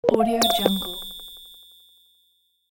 neuro glitch

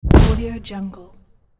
smoke bomb

